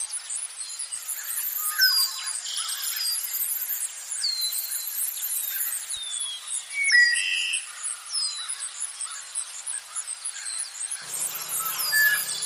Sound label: swamp sounds; Nature